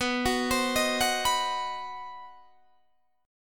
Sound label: C Minor Major 7th Flat 5th B, chord